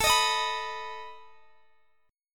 A Suspended 2nd Flat 5th A down Guitar DADGAD

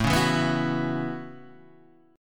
A 6th Add 9th A down Guitar Standard; A6add9; strummed

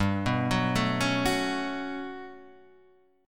G Major Sharp 11th G down Guitar Half Step Down

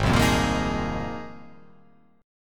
Ab 6th Add 9th, chord; strummed; JGuitar

